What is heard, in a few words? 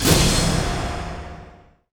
hit